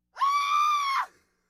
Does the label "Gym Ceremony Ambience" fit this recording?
No